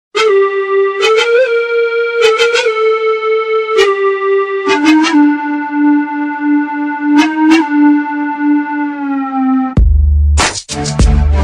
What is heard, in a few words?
flute